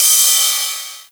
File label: Cymbals Ride; Vengeance Samples